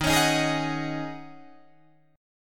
Eb Minor 11th Eb down Guitar Drop D

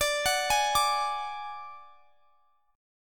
D Major Flat 5th; DMb5; arpeggiated; x,x,24,23,21,22

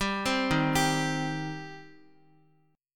Eb 6th Eb, Guitar; arpeggiated; x,x,5,5,3,2

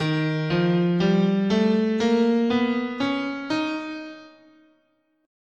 Eb Lydian Minor, scale

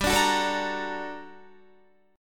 G Minor Major 9th, down, Guitar; strummed; JGuitar

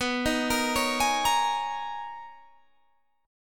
B Major 13th B, guitar

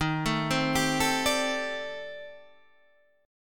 C Minor 6th Add 9th Eb

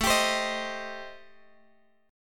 A Minor 7th Flat 5th, Guitar, Open G

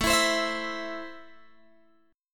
A Minor A down Guitar Open G

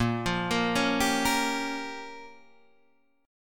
Db 6th Flat 5th Bb

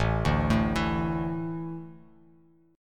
A Suspended 4th, Bass Standard, Asus4 chord; Asus4 arpeggiated; JGuitar

